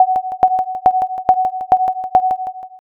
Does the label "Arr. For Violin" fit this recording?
No